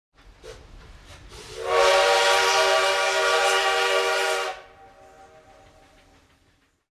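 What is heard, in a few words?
train horn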